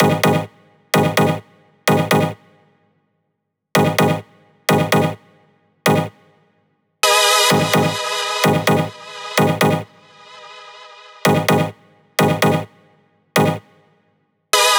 Freak Melody 2 Root G#, 128BPM; Vengeance Samples; Freak Kit

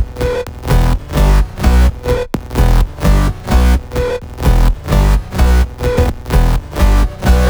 128BPM Melody; Vengeance Samples; Amplify Kit

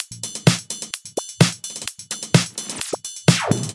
Electro Loop 128 BPM; Vengeance Samples

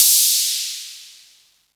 Crash, Cymbals; Vengeance Samples

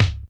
Kick, Trap

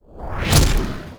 FX Impact; Vengeance Samples

